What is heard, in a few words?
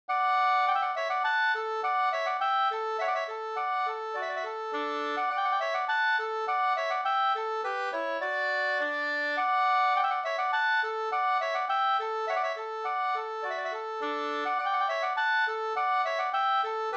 polka